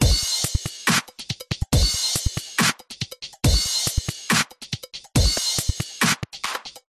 musical drum loop electronic 140bpm; Audio Mangler

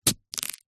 horror kitchen knife stab body twist; zapsplat

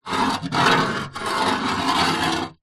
foley metal rod drag scrape on rusty metal table; zapsplat

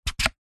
household phone charger wireless slide open; zapsplat

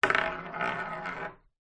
coin down on surface spin

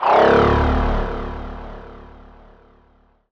Transition Morph; bottlerocket

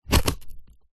cardboard sharp object dig in slight tear; foley

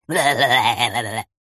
cartoon laugh male med high pitched crazy bonkers

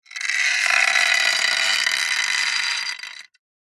metal stress creak thin tone; zapsplat

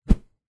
warfare weapon axe large object swing swoosh; zapsplat

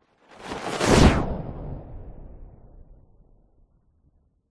superhero fly by fast whoosh cape; fantasy; zapsplat

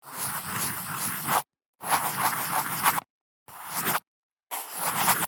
Handwriting Pencil; soundbits

